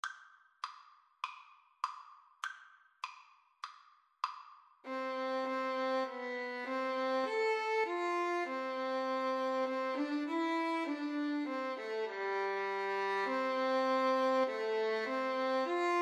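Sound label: flute violin karaoke; Violin-Flute Duet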